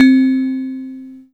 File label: Yamaha PSS-280 Glockenspiel C4